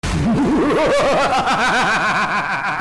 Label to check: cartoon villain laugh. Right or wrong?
Right